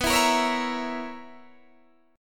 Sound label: B Major 7th Suspended 2nd Suspended 4th B down Guitar Open D